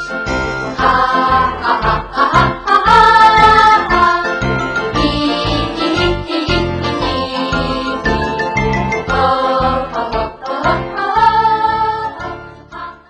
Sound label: HEE HEE